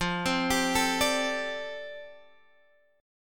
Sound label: F 6th Add 9th F Guitar Open G